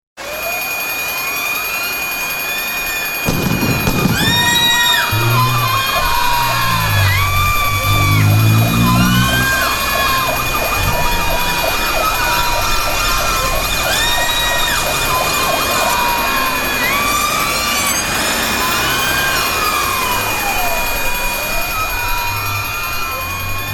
Dramatic and Cinematic sound of a bank robbery with alarm bell, siren, gun shots; Dramatic and Cinematic sound of a bank robbery with alarm bell, siren, gun shots, civilian screams and getaway car speeding off.